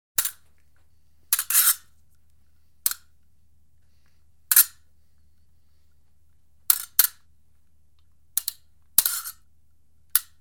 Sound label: Fencing - practice; The BBC Sound Effects Library; Sport and Leisure